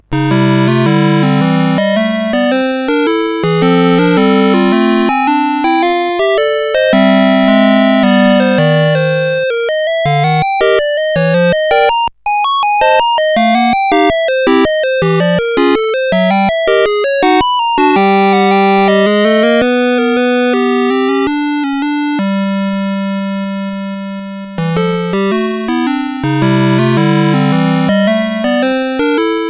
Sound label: Musicbox, sid CSG8580R5